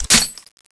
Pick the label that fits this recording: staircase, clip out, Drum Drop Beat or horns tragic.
clip out